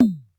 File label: Yamaha RM 50 Toms